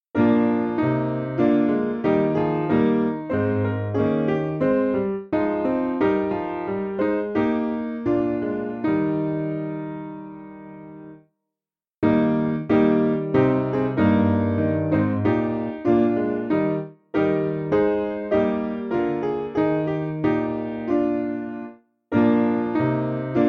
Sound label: S Piano